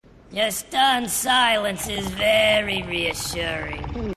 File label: Stunned Silence